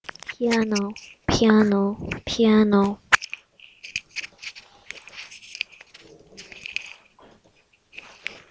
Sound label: piano